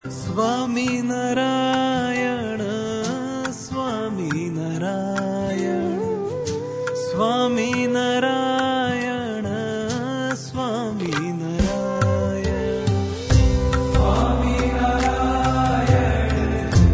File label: Dhun; Swaminarayan